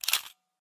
blitzer; minecraft

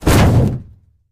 auto aufprall; minecraft

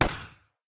waffen schuss; minecraft

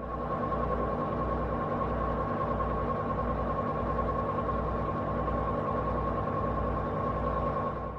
bus fahren; minecraft

